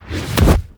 Camera Latch; Gameplay; Warframe; Chimera Prologue

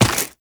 Run Dirt, Footsteps; Warframe; Tenno

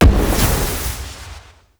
Prisoner Explosion; Gameplay; Mission Four; Sentient Narmer; Warframe; The New War

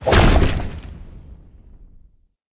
grunt swamp; Enemy; Combat; Characters; Cabbage Explo; Corrupted Sproutfolk

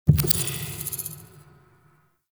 Lock Start; Warframe; Sacrifice; Minigame

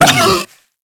Feral Vocal Pain; Warframe; Kubrow

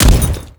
Ghoul Devourer Step Default, Footsteps; Warframe; Grineer Ghouls

